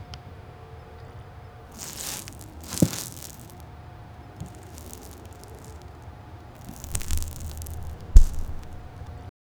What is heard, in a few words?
rough sliding sound of pl, baseball